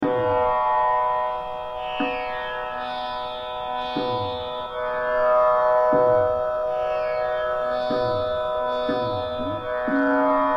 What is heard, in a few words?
Sadra, Jhaptal Dheema; Madhukauns; Rain Ke Jaage Ab